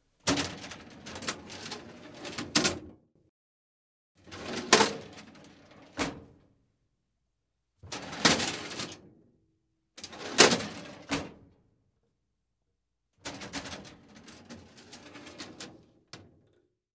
The metal cabinet door opens, closes

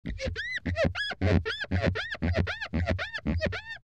Glass friction creak

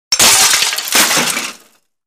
glass breaking

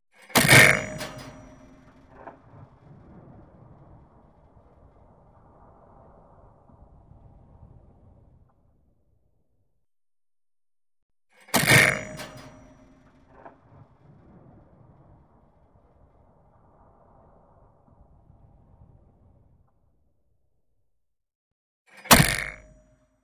Tank machine gun shots

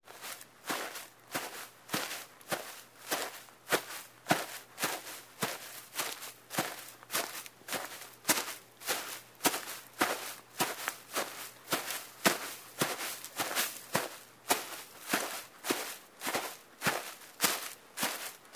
Footsteps on the grass

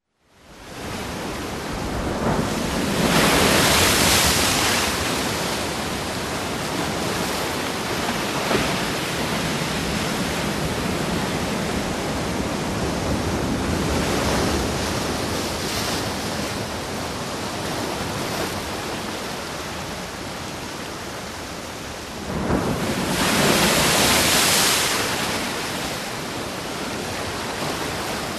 Waves crash on rocks; sea

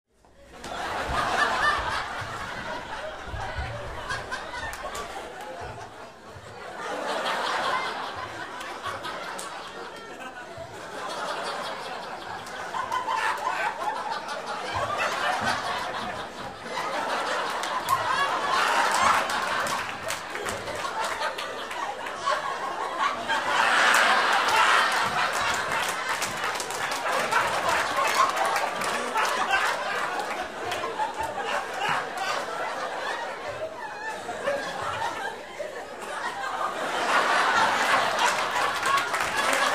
Laughter and applause in the room; standing ovation

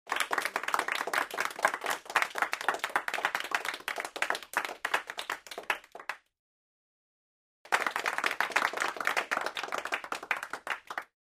Applause in a small room; standing ovation

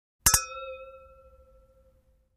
Hitting the glass; tableware

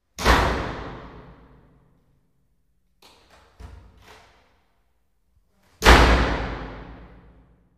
Wooden door slams shut

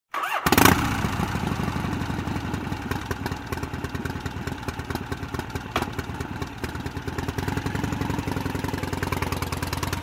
Harley-Davidson motorcycle starts, waits, moves

